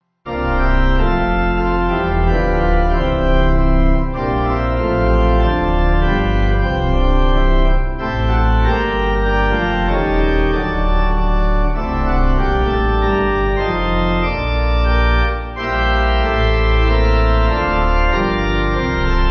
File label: Pipe